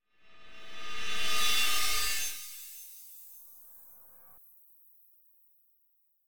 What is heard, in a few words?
Stinger Metallic Musical Cluster Wash Reversed; Hollywood Edge; sound designer's toolkit v2